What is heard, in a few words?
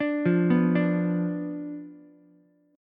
B Diminished B down Ukulele; Bdim arpeggiated